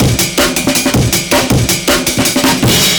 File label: Break, Loops